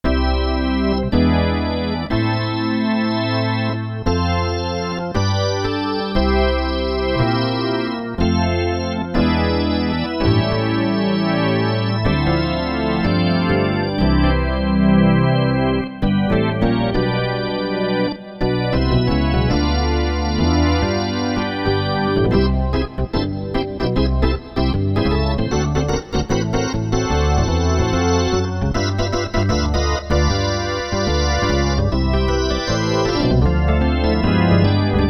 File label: Roland JD-800; All Stops Out; Factory Patches